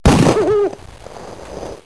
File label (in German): Eule